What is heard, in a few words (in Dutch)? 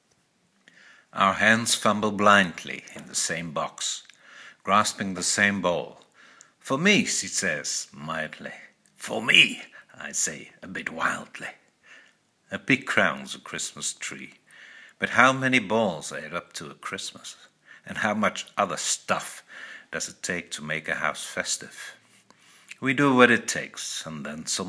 hartritme